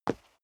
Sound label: step sneaker wood parquet slide short; schritte